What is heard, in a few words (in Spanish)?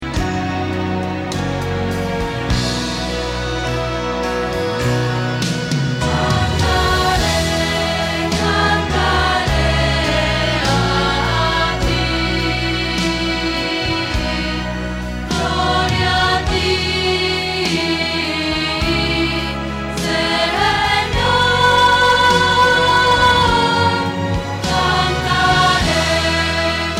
Contralto